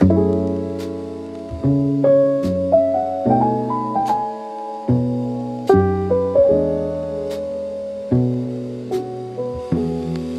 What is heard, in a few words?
Rain on Old Keys, lofi piano for recall and nostalgia